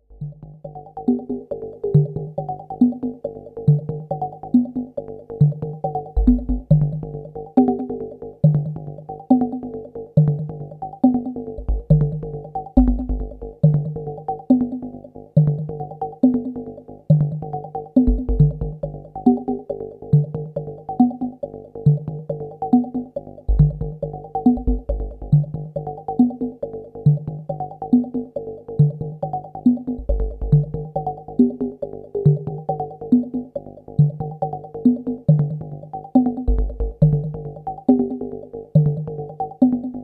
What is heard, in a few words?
Sine Power, The RS370 is in analogue real-time mode (monophonic)